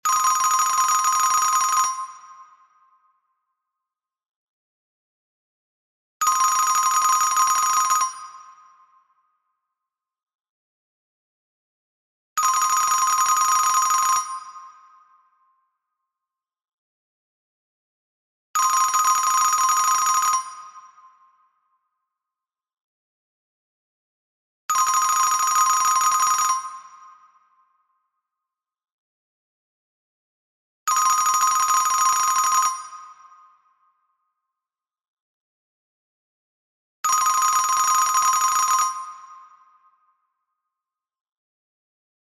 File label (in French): Telephone allemand